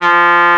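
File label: Trumpet mf F2; Digital Sound Factory Virtuoso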